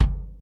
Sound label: bassdrum; fofix